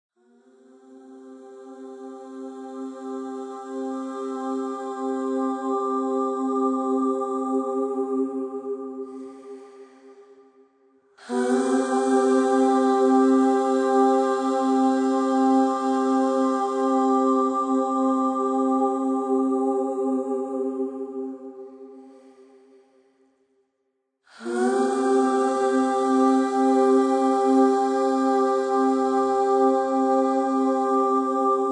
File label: Interval toning C G